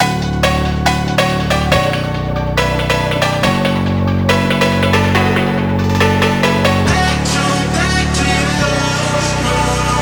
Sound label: Phonk Version